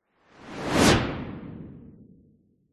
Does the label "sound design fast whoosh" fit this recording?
Yes